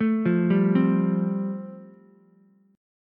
A Minor 7th Sharp 5th A, Ukulele; Am7#5 arpeggiated

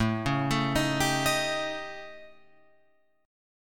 A 7th Flat 5th A, Guitar Open F; A7b5 arpeggiated; JGuitar

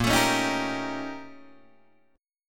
Bb Minor Major 13th Bb, Guitar, DADGAD; BbmM13; strummed; 8,12,11,8,10,8; JGuitar

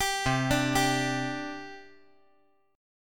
C Suspended 2nd, C, down, chord

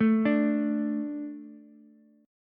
D 5th D, down, Ukulele; D5 arpeggiated; JGuitar

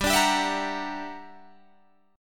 G Minor Major 13th, G, Guitar, DADGAD; GmM13; strummed

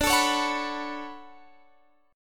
D Major 13th D down, chord; DM13 strummed

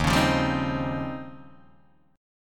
Eb 13th, Guitar, Open D; 1,4,3,1,3,1

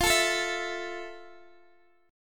F Major 7th, Guitar, Open D; x,x,15,15,12,14; down; chord shape

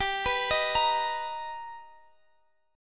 B Augmented Major 7th G, chord

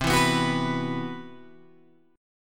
C-Major Sharp 11th, Guitar Open G

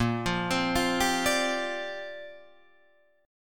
Bb 6th Add 9th Bb Guitar Drop D

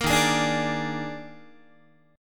Eb Major 7th Suspended 4th Eb, Guitar; EbM7sus4; strummed

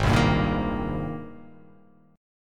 Ab Major 9th Ab down, chord; AbM9; strummed

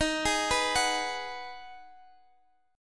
G sharp Minor 7th D sharp

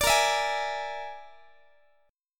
Bb 7th Flat 5th Bb down Guitar; strummed; x,x,17,20,20,19; 4A,4E,5A,5D,5G#,6C#; Bb7b5

